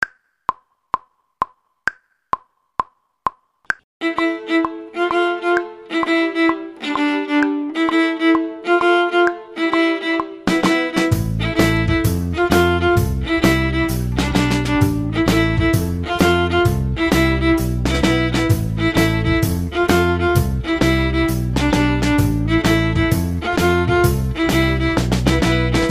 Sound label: violin section